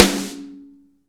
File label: SN ambient; Real Mega Drums